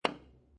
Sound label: Billiard Pool Snooker Hit Billiard Ball With Billiard Cue; Pool Sound Effects